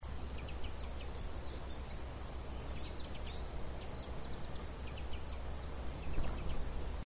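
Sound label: atori call, brambling; jinaki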